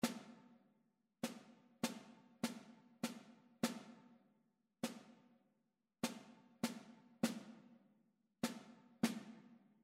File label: rhythmic error detection